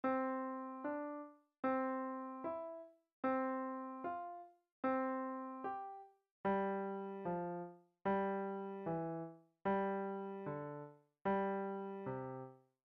Two Note Phrase, piano

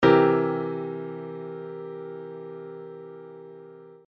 shell voicings 2nd

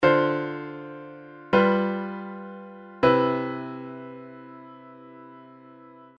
II V I three voices, jazz chord progressions

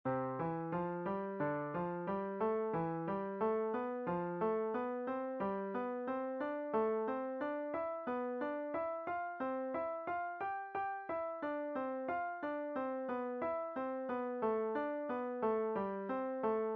Five finger step & skip, piano exercises

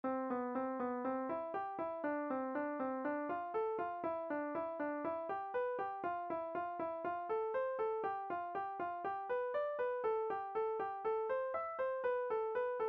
Crossing 2 over 1, piano

